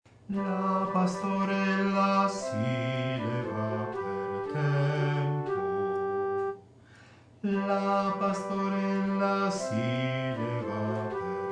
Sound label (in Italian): Pastorella Alti